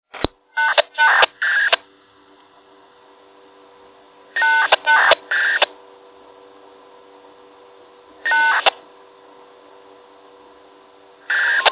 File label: NFM